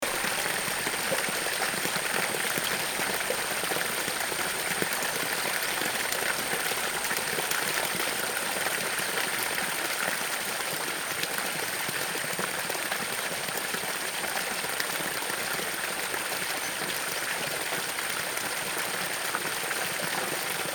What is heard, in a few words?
Tsuzura Tanada; Ukiha